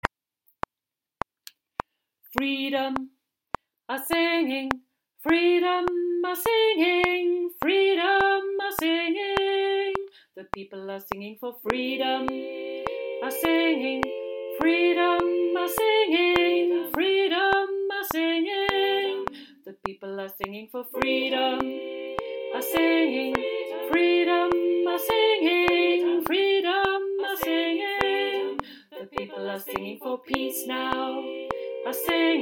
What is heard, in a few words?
the people are singing for freedom bass